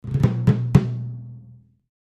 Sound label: Timpani Hands, mallets timpani